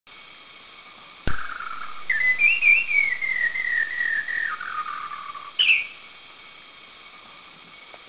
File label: White-browed Shama Subic; Philippines